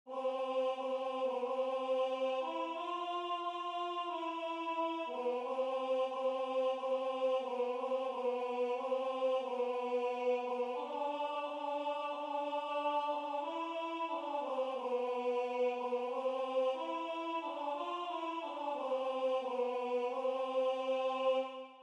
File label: Alto